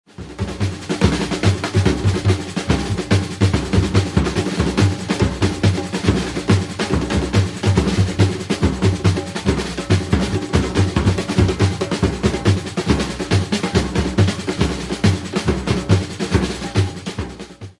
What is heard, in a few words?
Samba, Batucada; קטע סמבה / מהירות בינונית